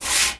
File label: Rubbing Scratching Papier Verre - Metal